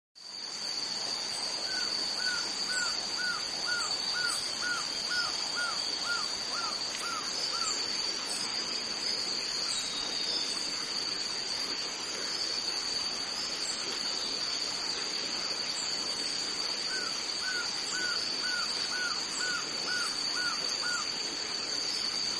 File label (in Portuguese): canto do trepador coleira